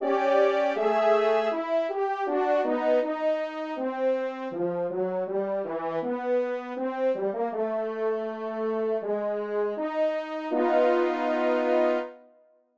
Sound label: stair chase; Lurk Monster Music 1